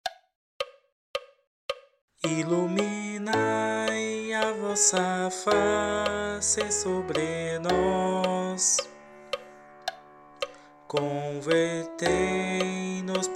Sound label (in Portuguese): advento coro; 4o dom